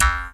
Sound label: jaw, Didgeridoo and Other Primitive Instruments; Big Fish Audio - Didgeridoo and Other Primitive Instruments; JAW SIMPLE